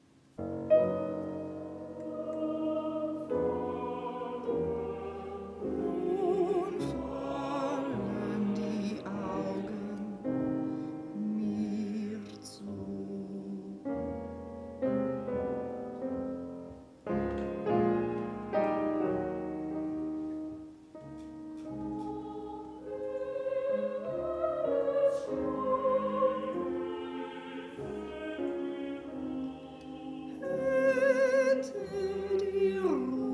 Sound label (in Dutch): BAS; Zur Nacht